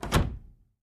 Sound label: Attic Closet Door Clo